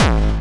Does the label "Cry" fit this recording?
No